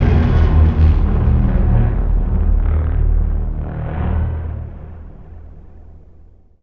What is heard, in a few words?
horn on end; COMPLEXHITS